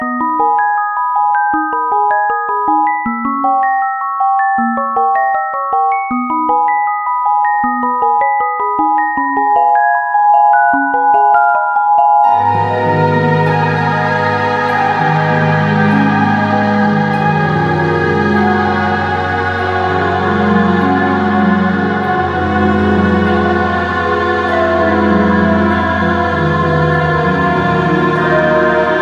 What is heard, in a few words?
sleepy